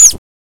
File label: MARK, FAIRLIGHT CMI IIX, ANIMALS